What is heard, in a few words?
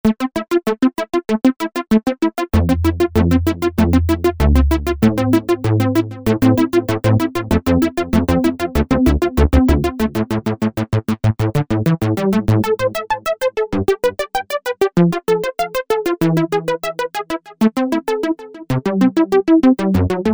SYNTH BASICS - Sequence Element; Apple GarageBand 2